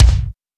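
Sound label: bass drum, drums; Best Service; Real Mega Drums VOL-1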